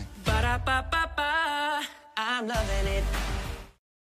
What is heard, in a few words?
new jingle; mcdonalds